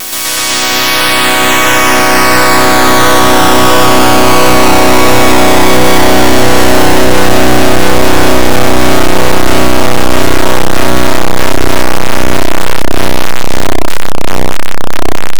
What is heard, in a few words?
pin pulse chord